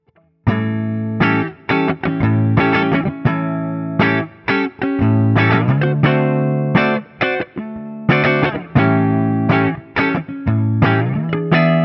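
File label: Guild T Bird ST ODS100 7th, guitar; Stop-Tail; Newark Street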